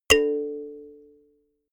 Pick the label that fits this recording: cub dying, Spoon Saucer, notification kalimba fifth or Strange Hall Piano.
notification kalimba fifth